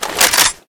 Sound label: rifle draw; weapons; deluge